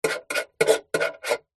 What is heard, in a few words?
office pen biro draw shape on metal box